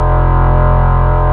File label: BS FUZZY, Wave Bass